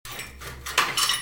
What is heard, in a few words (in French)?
Bruitage sur etabli; Outillages